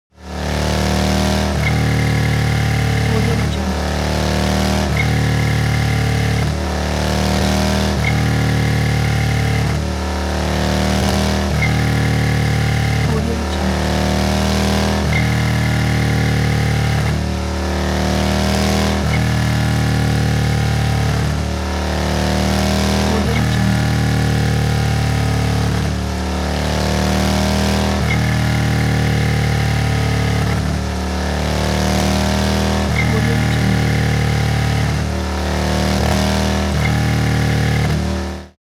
transportation motorcycle harley sequences short thrusts